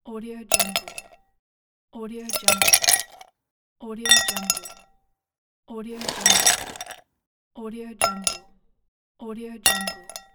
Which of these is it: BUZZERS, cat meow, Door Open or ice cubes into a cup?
ice cubes into a cup